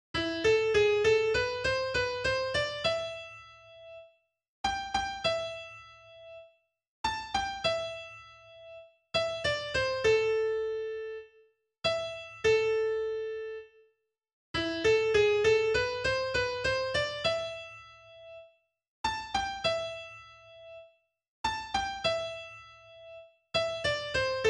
El Condor Pasa Traditional Solo AkGit

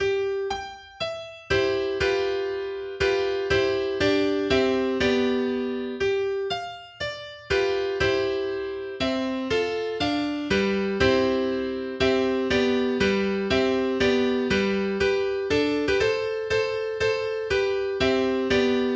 Menuett in C Dur, mehrstimmige Gitarrenstücke; Carcassi

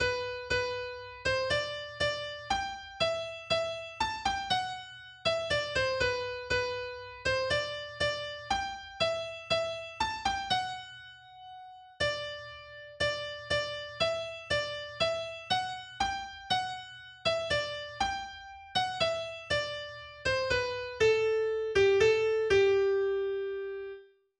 Sarabande Solo AkGit

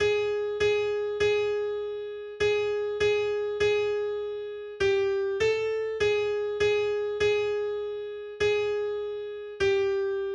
Vorübung, der Ton g# bzw. ab auf der G-Saite, Gitarre; der Ton g# bzw. ab auf der G-Saite und das Auflösungszeichen ♮; Gitarrenkurs zum Erlernen des Notenspiels auf den untersten vier Bünden; g#/ab4

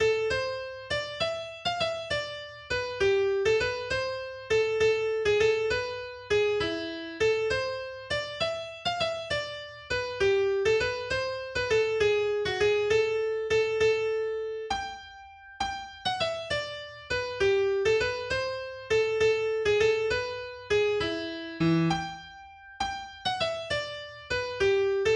Greensleeves Solo AkGit

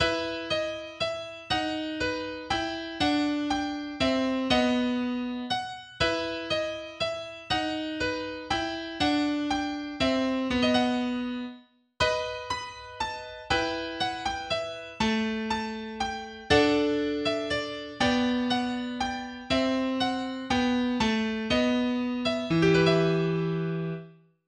Menuett in E Moll; mehrstimmige Gitarrenstücke; Visee; Org + Tab

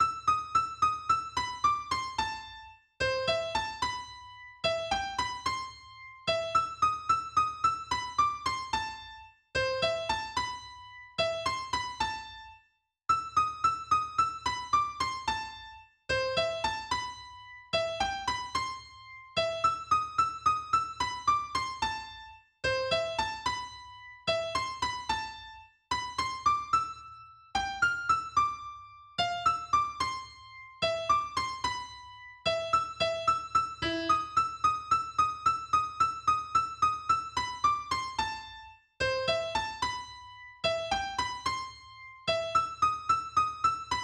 Für Elise Solo EGit; Beethoven

